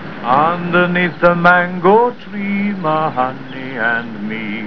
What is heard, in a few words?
singing; connery